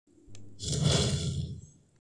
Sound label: nitro fists punch; fortnite